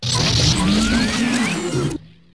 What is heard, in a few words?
destroyer Droid death